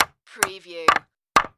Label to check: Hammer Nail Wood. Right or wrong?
Right